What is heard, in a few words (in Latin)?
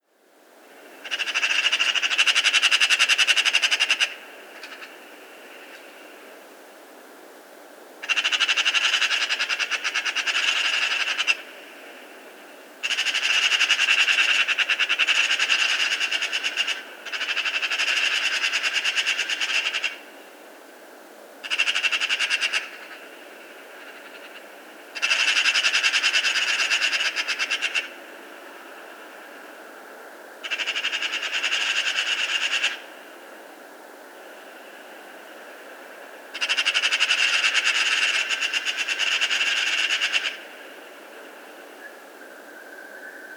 Pica pica; SETE